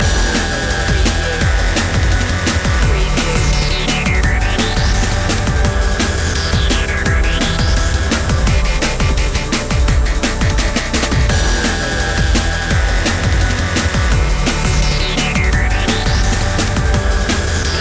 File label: living dnb loop